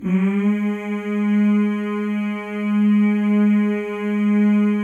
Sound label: male; Spectrasonics; Symphony of Voices